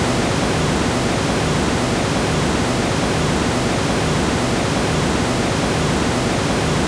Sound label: Brown Noise